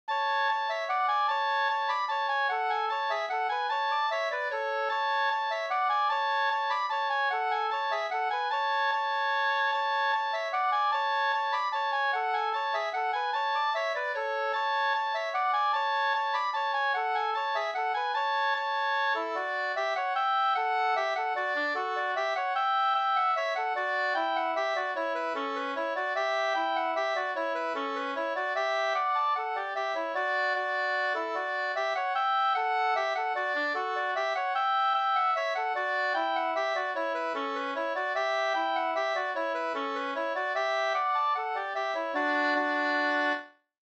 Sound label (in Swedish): langdans; Svangrumpa Den lange dansen 2st